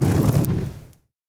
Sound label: flames impact; fire; impacts